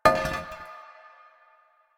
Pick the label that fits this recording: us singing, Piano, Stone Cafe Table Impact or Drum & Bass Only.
Stone Cafe Table Impact